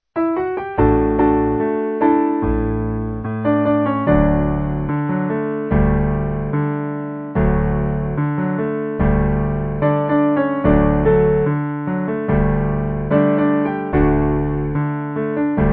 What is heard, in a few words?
Piano